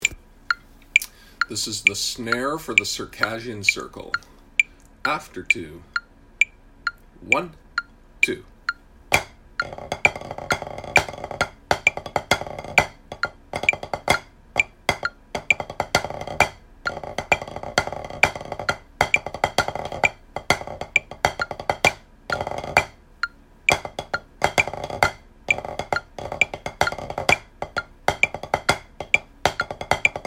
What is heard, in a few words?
Snare; Circassian Circle